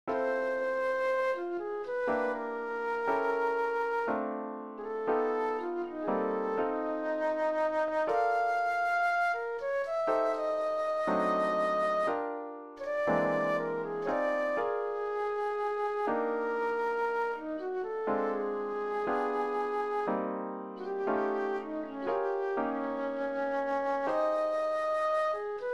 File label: bllues lick play along